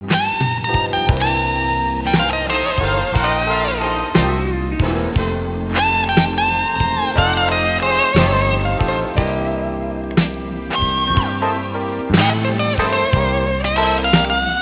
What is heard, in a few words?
blues